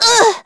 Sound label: Vox Damage; Jane; kingsraid; voices heroes en